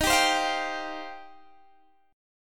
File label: Eb 6th Add 9th Eb, Guitar Standard; strummed; x,18,17,17,18,15